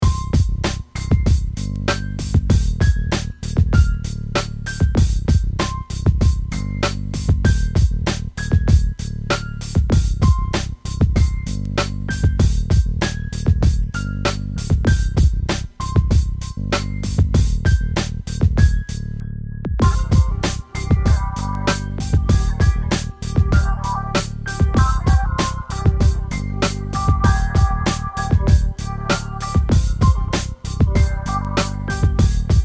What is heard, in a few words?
beat